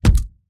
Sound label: Mech Footstep Base; starbites